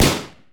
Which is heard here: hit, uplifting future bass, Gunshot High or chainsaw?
Gunshot High